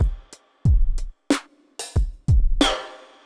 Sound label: deep beat 92